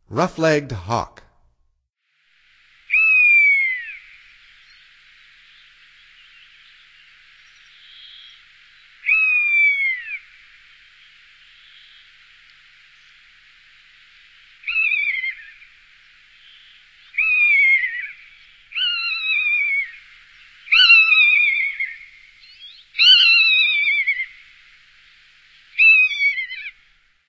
Rough-legged Hawk, Songs of Birds of North America; Stokes